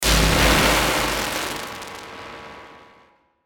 Static Hit Sweep Descend, Descend Static Shimmer Vibrato; Production Element Imaging Element Accent Transition; Blastwave; Static Sound Effects